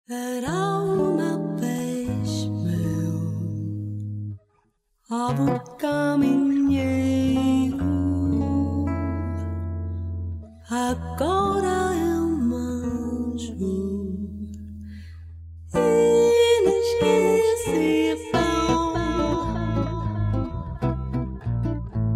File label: SAMBA